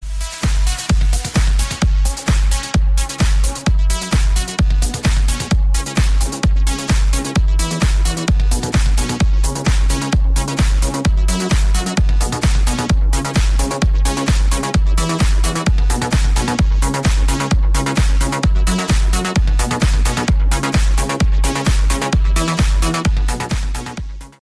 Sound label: Remix Tone